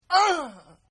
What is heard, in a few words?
Grunt; Grunts; Sound Effects:Voice; Partners In Rhyme